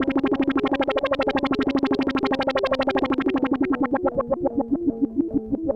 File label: ethno 125; eJay